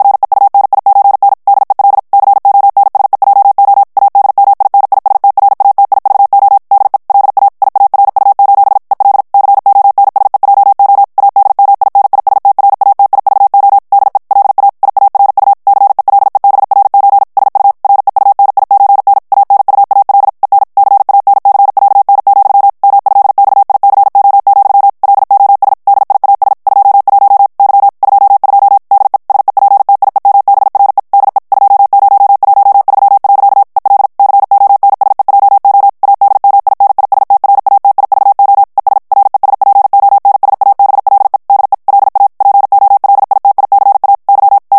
ESPAGNOL TEXTE EN CLAIR VITESSE 50 MOTS MINUTES, morse

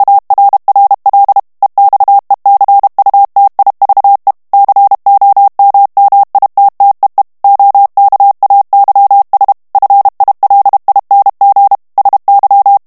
ANGLAIS texte clair vitesse 30 mots minute, morse; Membre des Clubs

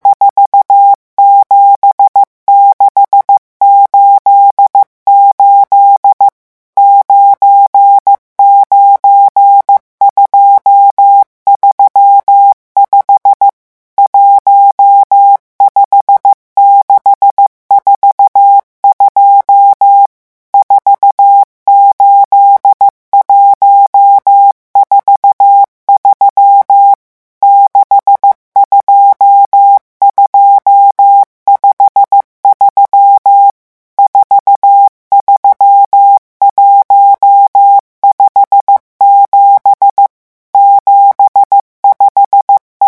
chiffres vitesse 14, morse

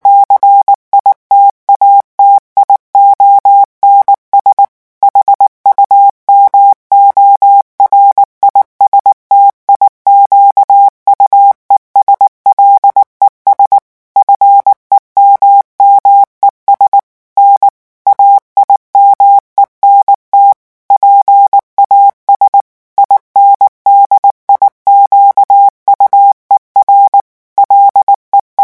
citations humoristiques vitesse 18 mots minute, morse

